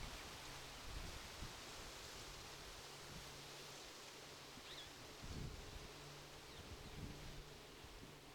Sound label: Ambience Trees Rustling Wind